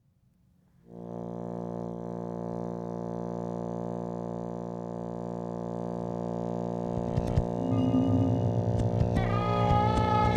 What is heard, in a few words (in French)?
Etude aux Trompette et Bassoon